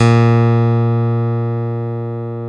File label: PNO, Electrics, Grands; Club-50 - Foundations